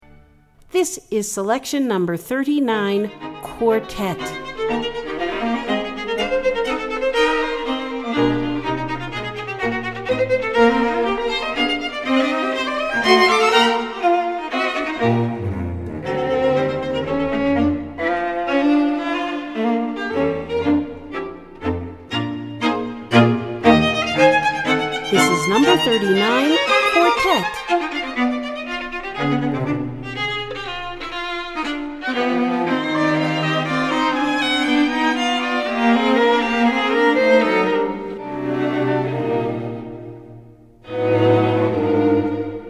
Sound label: Quartet